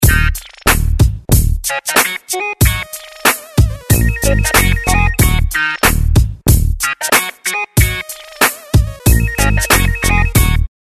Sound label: Clapper, ringtones; Nokia; 2760b